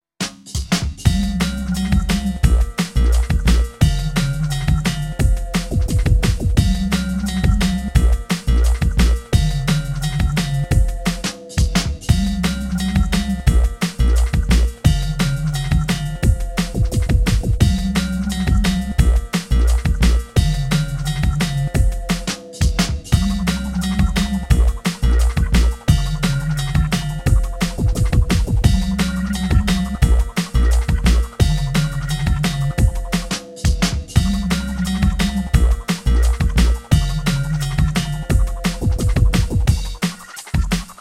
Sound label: gameover; Marc Ecko's Getting Up: Contents Under Pressure; XBOX